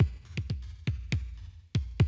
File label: 120, Classic Drum Loops